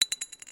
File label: jewel falling